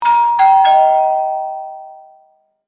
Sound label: doorbell chiming; light bell sound